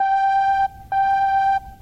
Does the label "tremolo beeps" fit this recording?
Yes